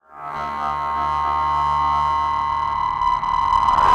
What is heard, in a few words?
Swell Distorted; bottlerocket